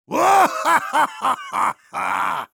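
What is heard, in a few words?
laughter big, German; Heavy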